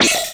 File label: Flash Wipe; Multimedia Internet CD-Rom Flash